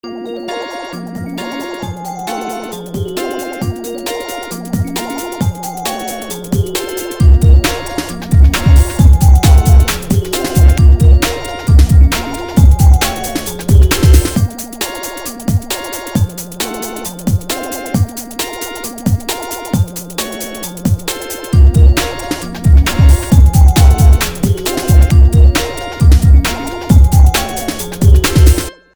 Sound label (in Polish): Muzyka Smierci; Indiana Jack